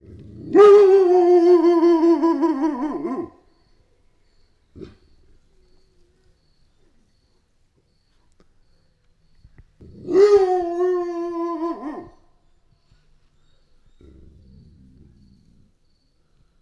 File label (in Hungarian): duplázva vonyít 50 Hz, szürke farkas; hortobágyi nemzeti park 2013